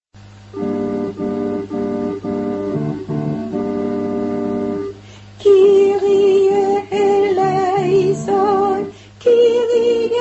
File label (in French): messe kyrie; messe de san lorenzo al595